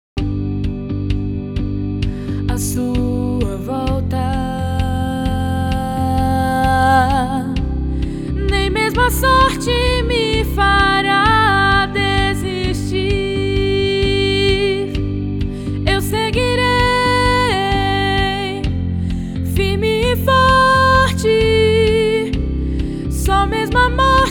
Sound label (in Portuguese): soprano